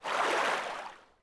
tread; DAoC

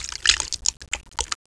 Wet; Princess and the Pea; SoftKey

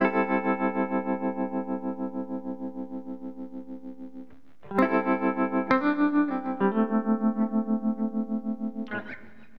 TREM GT, Acid Jazz Funk, Guitar; LiveAct Pool

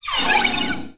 power trance skill; Earth and Beyond; EA Games

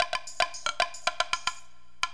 tribal gourd; SGI O2 Out of Box Experience; jungle Island; Cyber Gourds

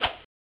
Sword swipe; AMOS Music

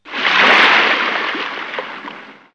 splash

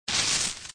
Grass Burn; mystic training

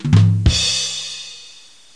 Drum Fill w- cymbal; Comedians - The People Behind the Laughter

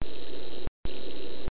cricket; REDNECK; RAMPAGER

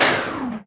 LIFT RELS; lander

